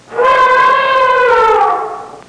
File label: Classic elephant sound